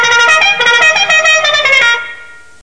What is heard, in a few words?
klaxon; Mobiclic46